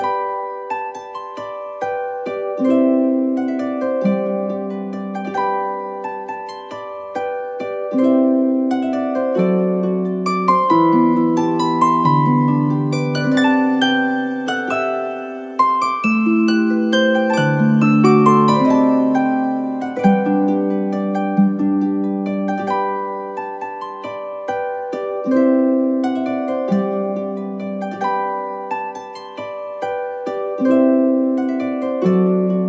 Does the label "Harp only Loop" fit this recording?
Yes